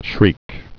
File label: shriek